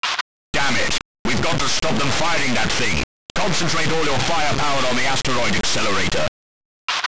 military genbridge; Phoenix

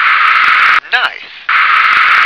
knife

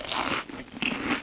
TURTLE

